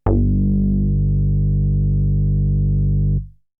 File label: Bass; ZERO G - Datafile DISC 1